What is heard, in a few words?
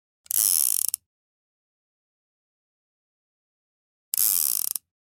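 revolver cylinder spinning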